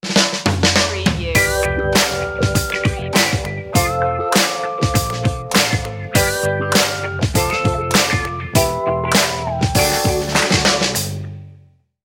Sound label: old school vibe stinger